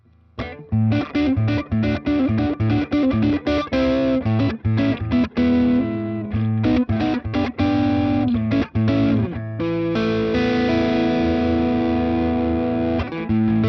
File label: OD 335